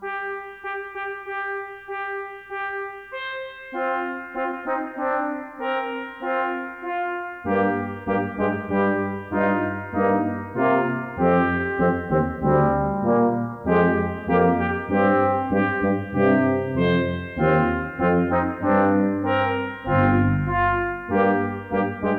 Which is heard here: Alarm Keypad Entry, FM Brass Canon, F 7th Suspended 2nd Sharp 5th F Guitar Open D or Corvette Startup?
FM Brass Canon